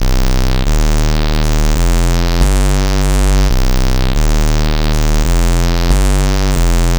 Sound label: Square To The Rave; Rave Generator 3; 90’s Rave & Techno VST/AU/AAX/CLAP Plugin